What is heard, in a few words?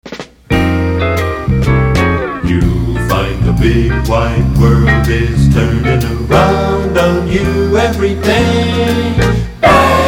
4 Guitars Voc; 4-guitars, Vocal